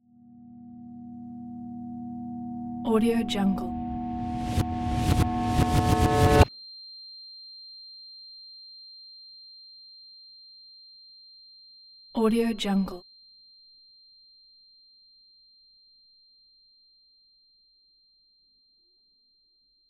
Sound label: tension flash concussion; fascinatedsound